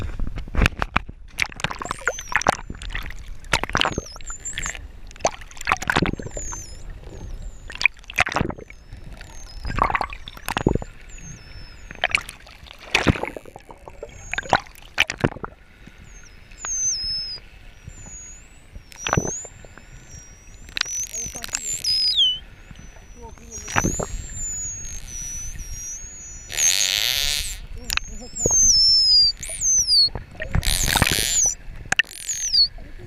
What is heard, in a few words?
False Killer Whale audio